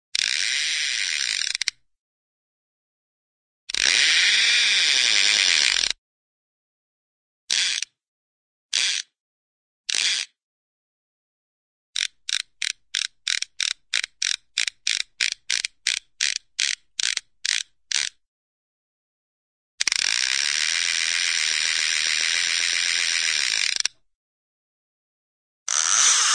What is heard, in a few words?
fishing reel short fast wind; cartoon